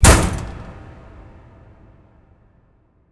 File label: impact hatch; Hits